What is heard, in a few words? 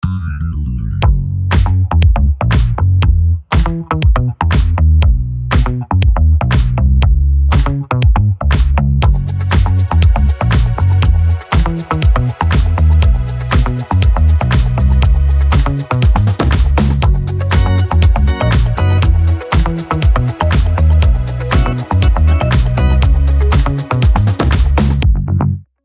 disco; 50 shades of disco